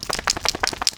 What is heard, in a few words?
dog shake; casualtiesunknown